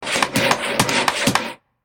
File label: Vehicle construction